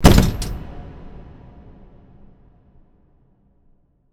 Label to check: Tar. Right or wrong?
Wrong